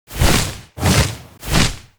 Winged Mount Play Underlay

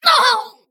Female Crystal Dryad Death

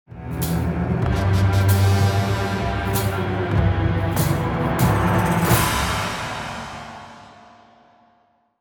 play stinger; Subject 9

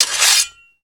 Black Pawn Play Underlay

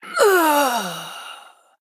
Female Human Death

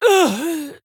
Female Pandaren Death

